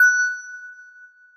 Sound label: Bell F#7